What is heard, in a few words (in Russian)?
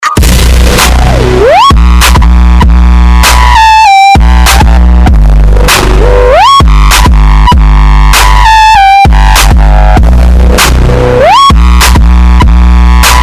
muzyka dlya budilnika idealnaya melodiya dlya budilnika